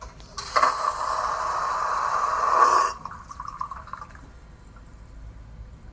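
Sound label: Toilet flush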